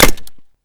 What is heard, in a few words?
Simple Gunshot Reverb